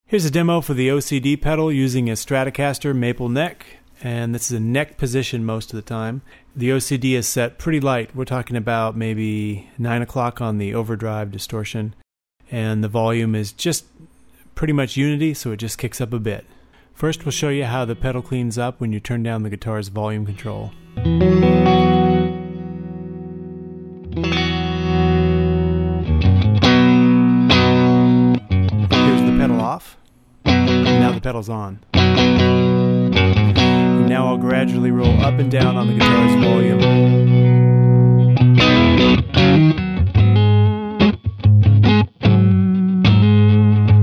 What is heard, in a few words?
18 watt; OCD